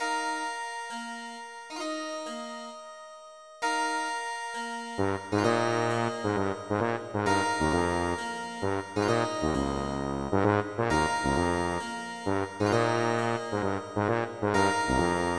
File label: tuba and harpsichord, chiptunes